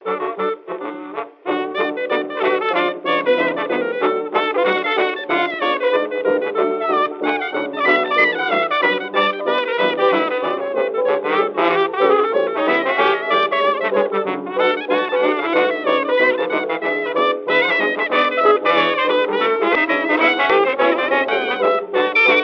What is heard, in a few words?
Jazz denoised